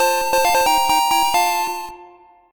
Item get; Pokemon Essentials; Pokémon Estelar

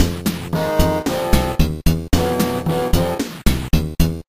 desert, music; SuperMarioWar

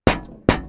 glass ton; easyrpg; Ib

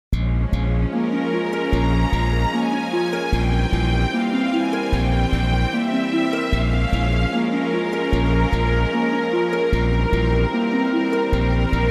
Tension; Pokemon Opalo; Pokemon Essentials

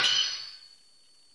Sword; Pokemon Essentials; Pokemon Opalo

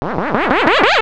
feather; superbroswar

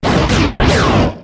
Cry

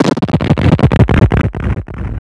thunder; superbroswar

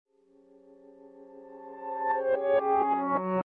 atmosphere; easyrpg; WitchsHeart

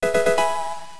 Move Learnt; Pokemon Essentials; Pokemon Circon BETA 2 v1.8

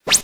Open Menu; Pokemon Essentials; Pokemon Circon BETA 2 v1.8

